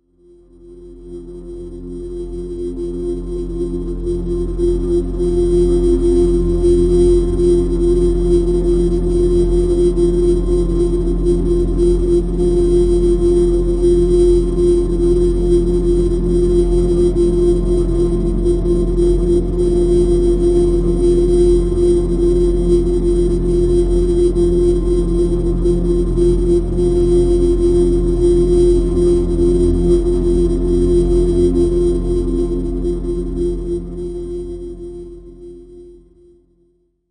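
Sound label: drone; Neutrality F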